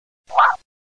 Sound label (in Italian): VOLPE